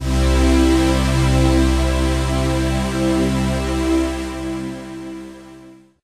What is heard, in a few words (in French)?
synthetiseur son